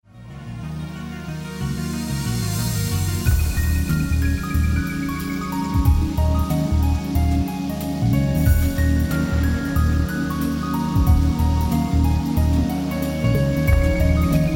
Inspirational Piano

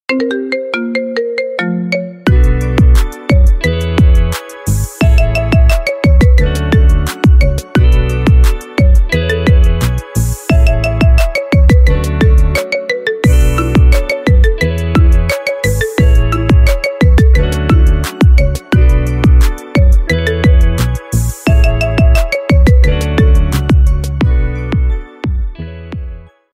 Marimba Remix Ringtone

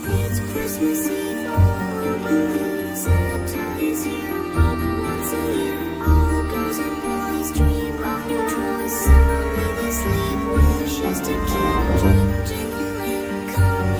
scary christmas

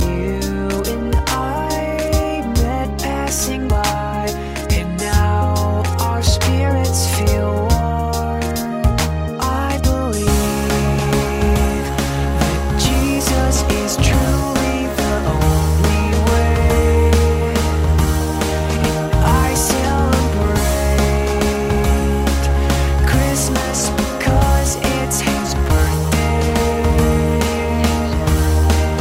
christmas song

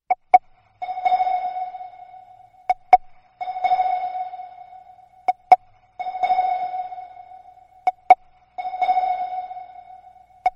Ui Startup SMS

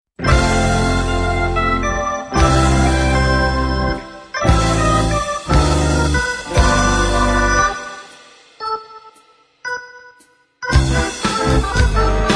bubble bubble game

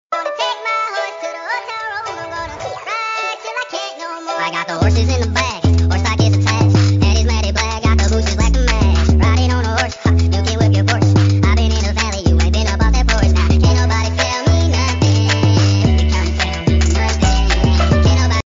instrumental

